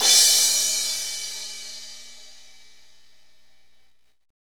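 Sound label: cymbal techno, Roland; Northstar; Drumscapes Roland; Cymbals 2; Crash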